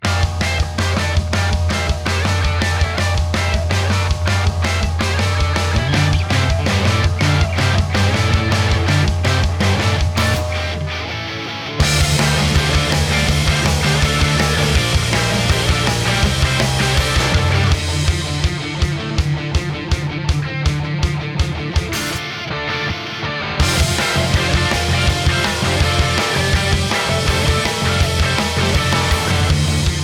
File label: Instrumental; Yorinuki Gintama-san